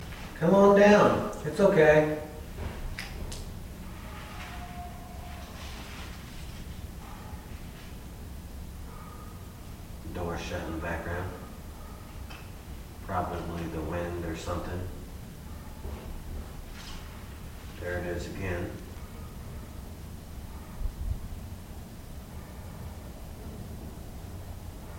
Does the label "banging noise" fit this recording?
Yes